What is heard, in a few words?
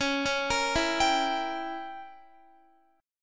Db Diminished 7th, Guitar; arpeggiated